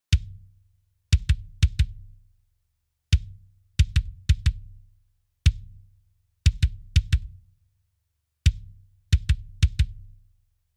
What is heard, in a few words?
kick on